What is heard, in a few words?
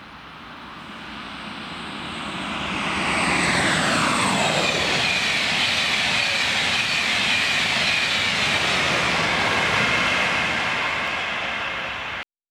railjet